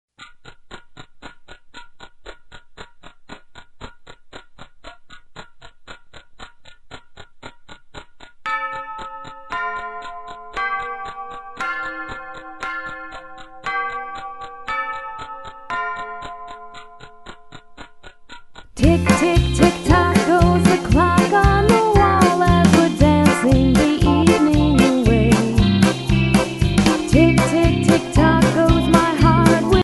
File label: Polka